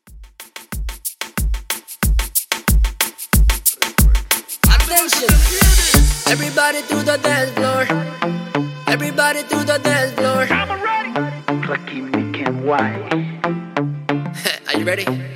Dance Hall Reggaeton 92 Bpm

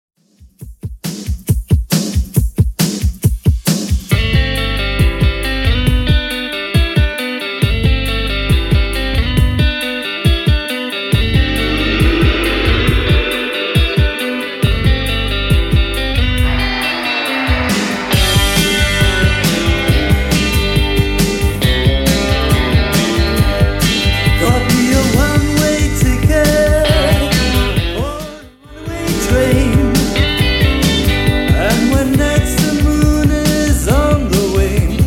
80s Redrum, 137 bpm

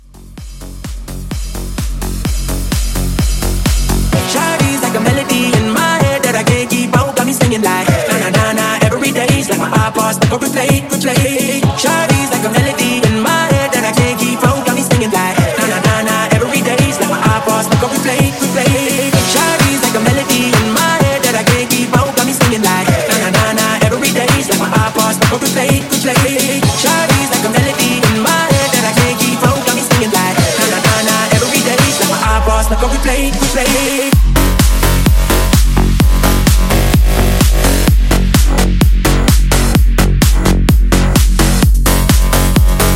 128 CLEAN

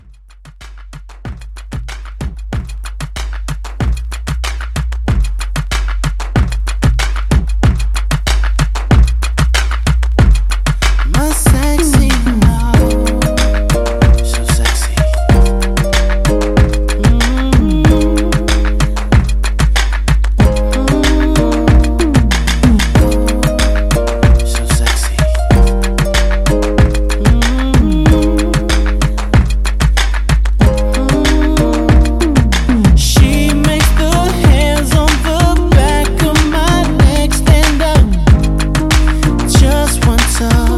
RnB Re Drum

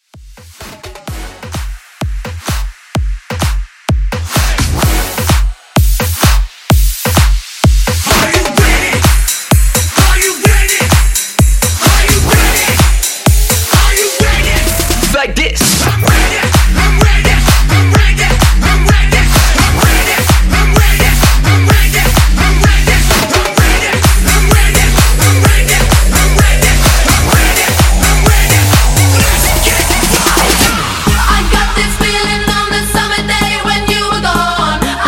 EDM Hype

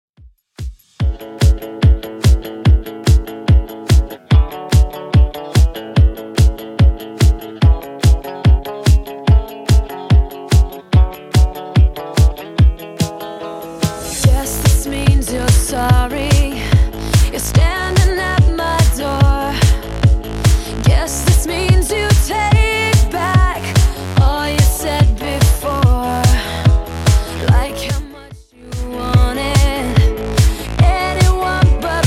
145bpm

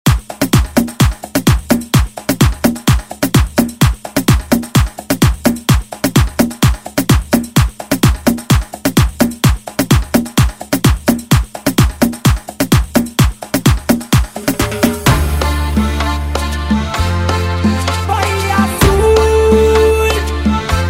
Latin Redrum; Deejay Hit

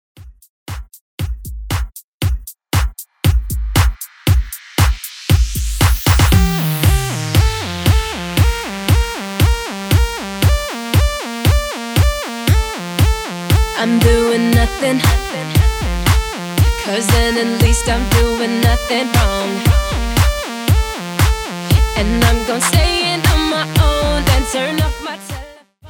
Extended Redrum

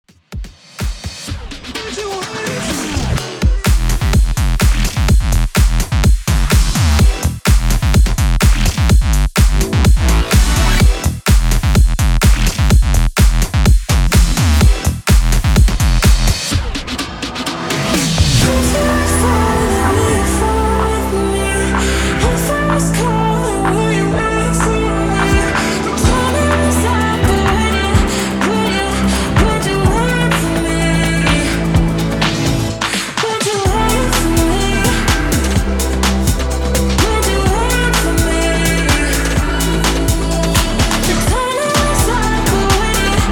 126 BPM

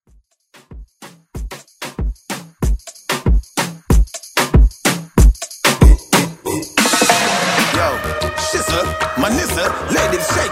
Moombah Redrum

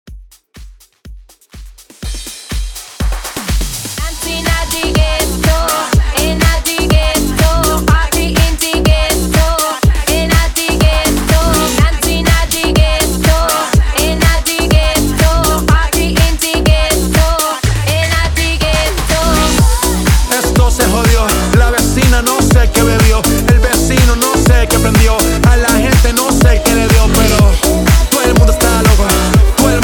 Mashup 123 BPM

